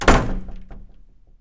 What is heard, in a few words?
door close; Golden Crayon Sounds